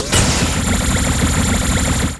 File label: spinner; Tanks